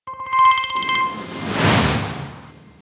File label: player progression gauge start level up